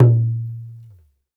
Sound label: Talk Drums